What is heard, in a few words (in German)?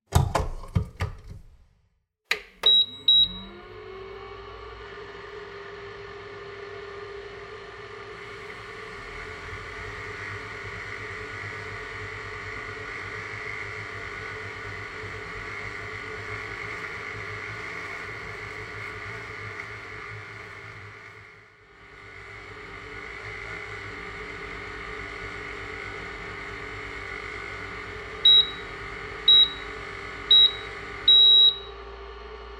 milchschaumbereiter